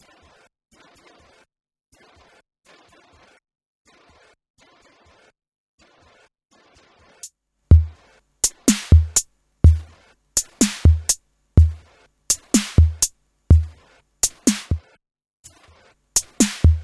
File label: Boss DE-200 Digital Delay Sync Juno-6 TR-606 Sampling